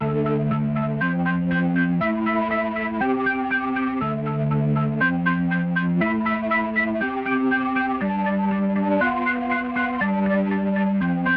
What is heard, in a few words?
waterfall hammond b3 organ experiment